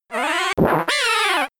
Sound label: Growl